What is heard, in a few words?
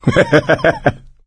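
laughter, male; Left 4 Dead 2; coach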